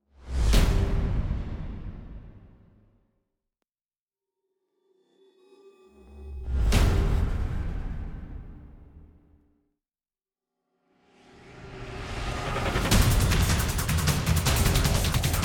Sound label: percussion only